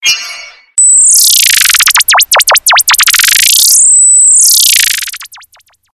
oppo meddelelse, ringetone

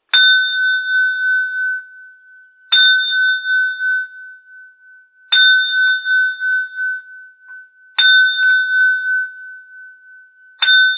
hoj klokke, ringetone

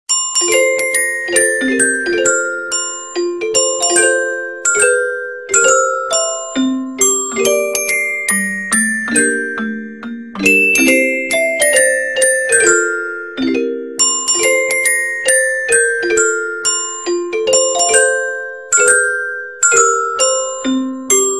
julekaerlighedsklokker, ringetone

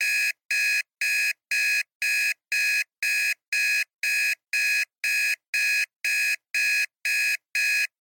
Klassisk Vækkeur ringetone

